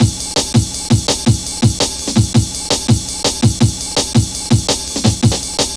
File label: Harsh, Drum Loops 166.5; Zero-G Jungle Warfare 1, 2 & 3